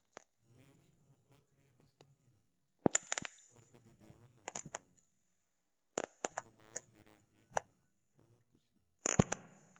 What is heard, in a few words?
slowed and reverb no vocals